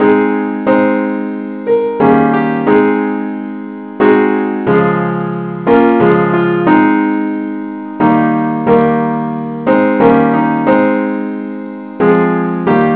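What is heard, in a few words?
simple accompaniment key A flat; i need thee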